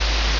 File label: ambience room tone